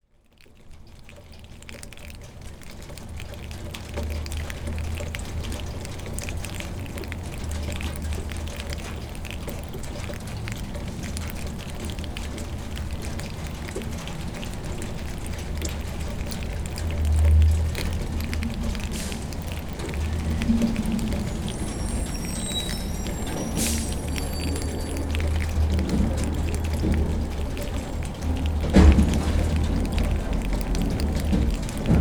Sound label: gutter